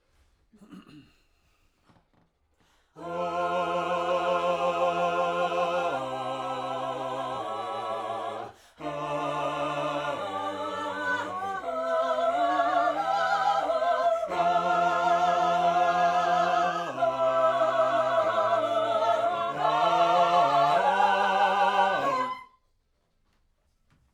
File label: Backs SCHOEPS